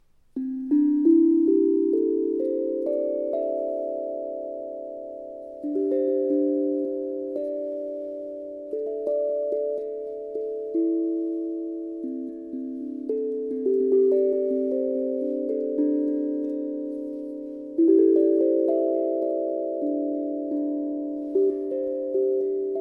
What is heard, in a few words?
Do major pent 440